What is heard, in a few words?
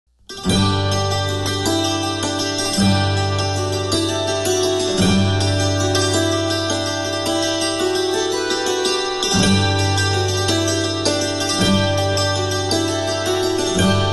Jig; One Horned Sheep; Julia Delaney; Bellman's Jig